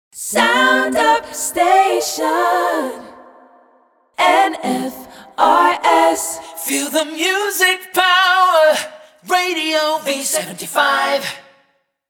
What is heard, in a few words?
Jingle Aca